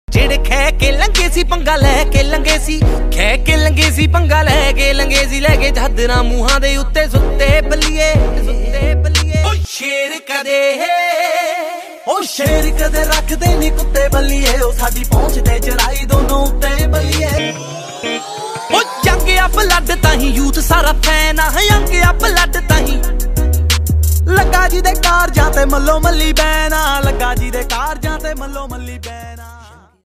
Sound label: Punjabi